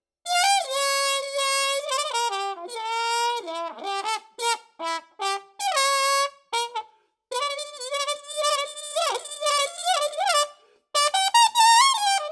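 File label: 155 Trad Jazz Trumpet Solo, G major; Brass; Pro Tools Essential Loops; Digidesign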